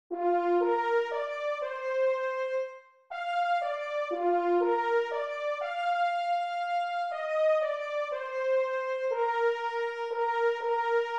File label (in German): sopran